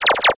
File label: Blaster Fire, cartoon Foley Fx